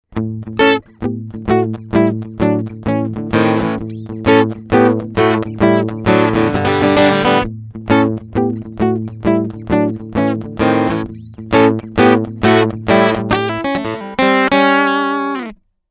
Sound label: Blues Picking